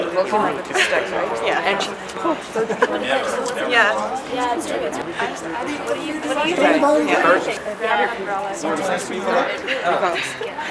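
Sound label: crowd; Mafie 1 cz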